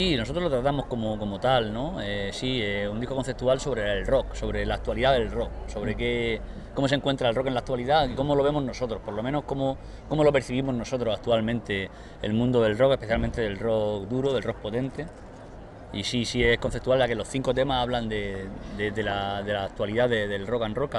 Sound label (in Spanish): Disco conceptual